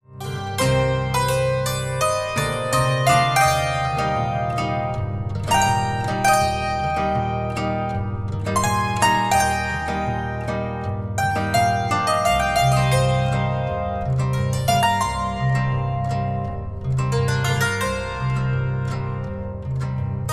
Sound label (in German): Harfe und Hackbrett